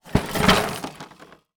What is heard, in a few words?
Foley Rocks Metal Clamps Wood Styrofoam Plastic Tub Rattles